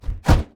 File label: dragon wing, monsters; LoL